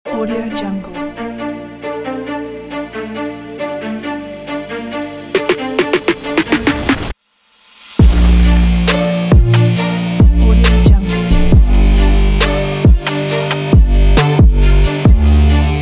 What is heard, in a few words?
action hip hop epic beat